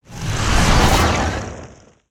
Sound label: Spinjitsu Fire One shot